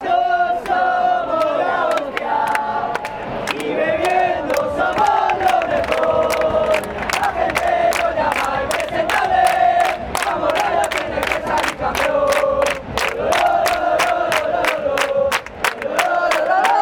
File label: impresentables fan chants